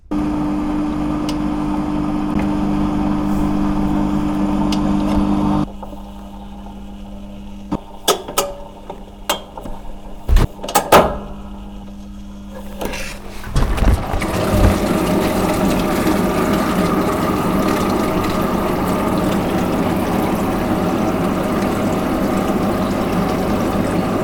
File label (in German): Die Waschmaschine